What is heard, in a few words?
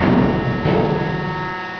oil pump